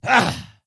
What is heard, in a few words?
attack; Skadi The Ruthless